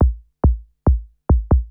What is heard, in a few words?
KICK TECHNO 140, Techno Drum; Techno2